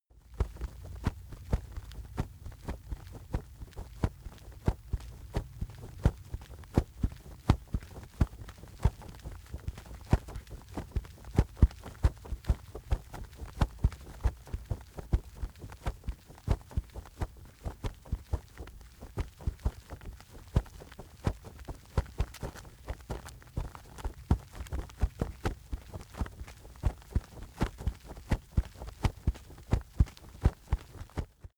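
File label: wings flap calm, birds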